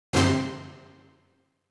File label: Orchestra hit CS1X